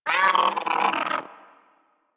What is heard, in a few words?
Hyena Micro Vocal Pain; Warframe